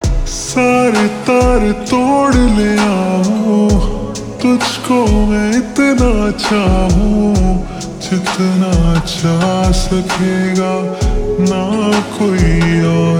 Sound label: Ringtone slowed reverb